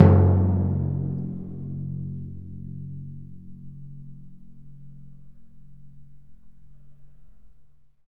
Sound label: DRM, Orchestral Perc; Roland LCDP03